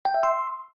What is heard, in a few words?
speech recognition success